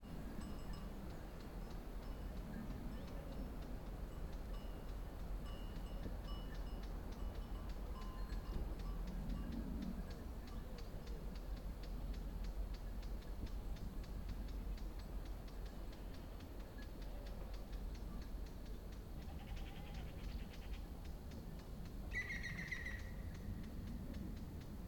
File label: Village Meadow Morning Distant Goat Herd; Systematic-Sound; General Ambience Series; Towns & Villages 01